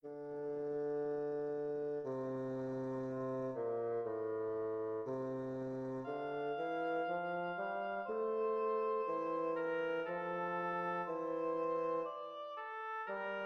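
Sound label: duo oboe bassoon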